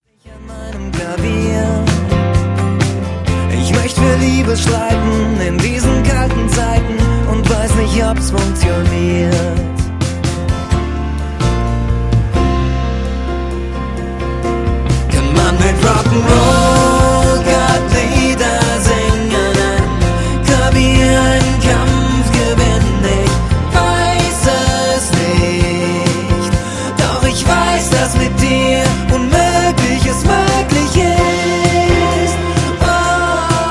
Klavier

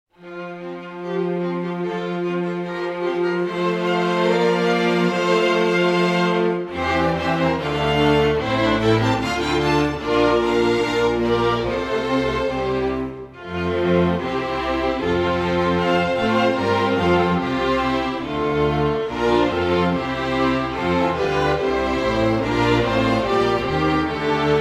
Instrumental

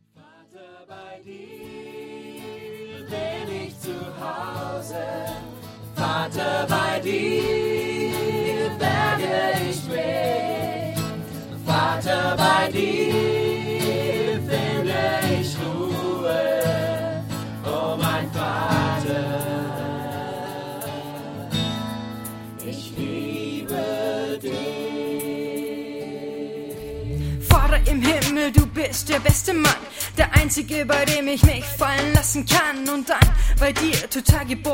Vater Rap

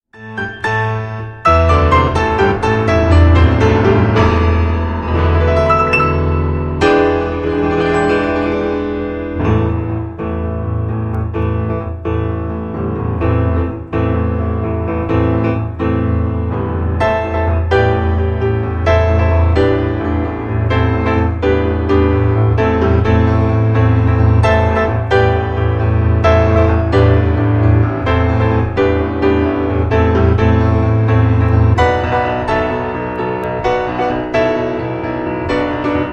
Vater Unser Instrumental

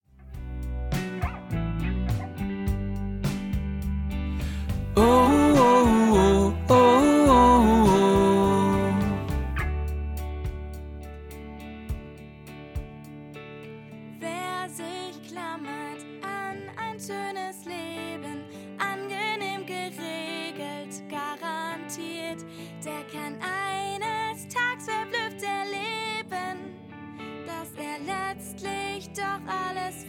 Lern-Tenor

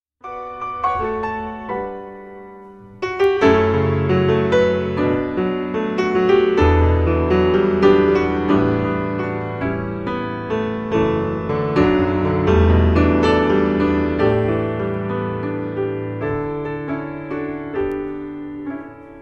Vater Unser Instrumental

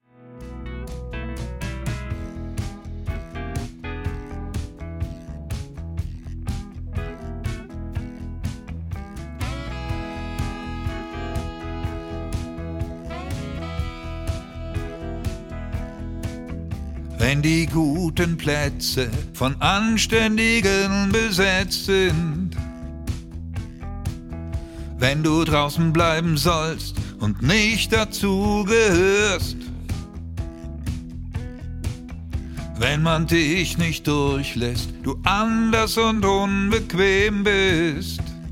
Lern-Tenor und Solo

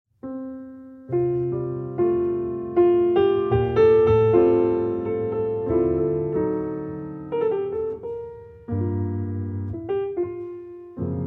O Tannenbaum Instrumental

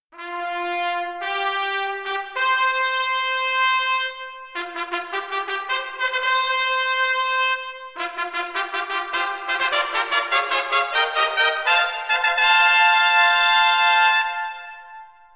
Koenigsfanfare

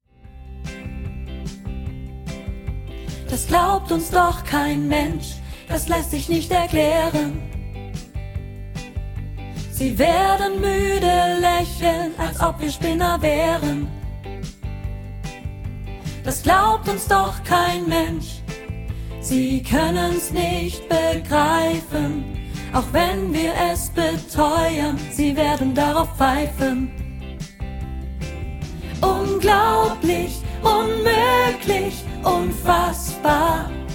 Lern-Sopran

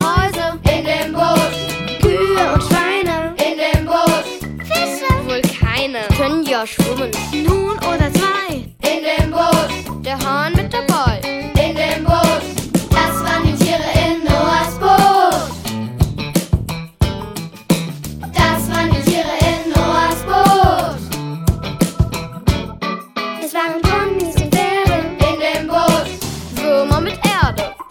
Rap

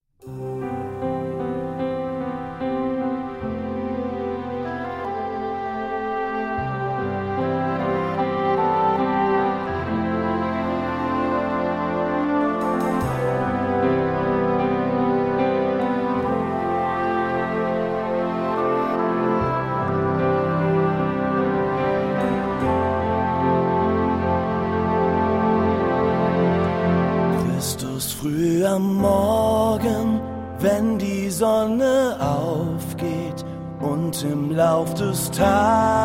Chor

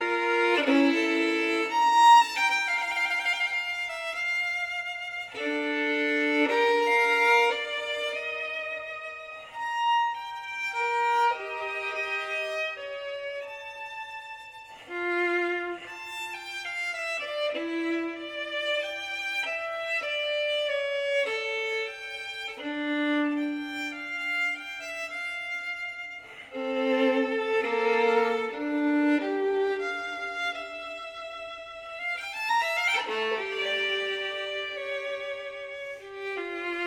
Adagio aus der Solosonate G-Moll BWV 1001; J S Bach

